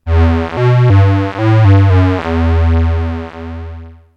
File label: Odyssey VCF